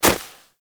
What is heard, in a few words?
Operator Walk Snow, Footsteps; Warframe